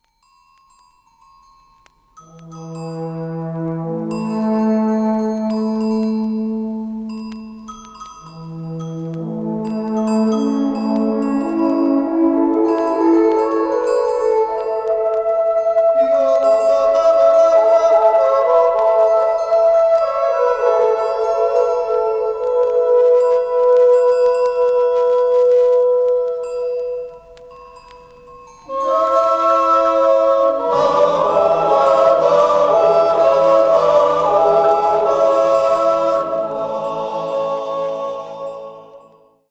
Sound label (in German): Alphorn Jodel